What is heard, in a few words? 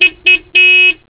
miata horn; Motorola MPX200